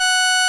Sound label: SOLINA, String Machines